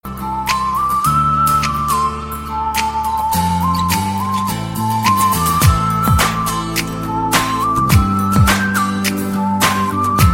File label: flute ringtone